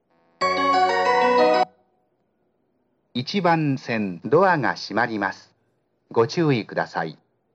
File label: melody; ryogoku